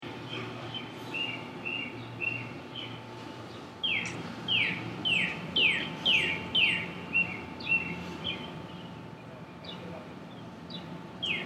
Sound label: Field Recording Outside, Morning; Location: Walking outside from library to Bit's n Bytes.